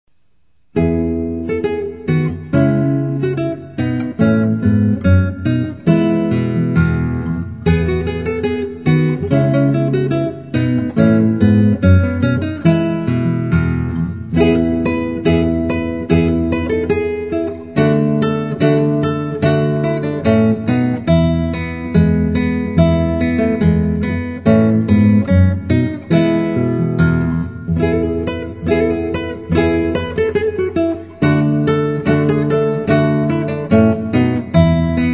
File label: kemps jig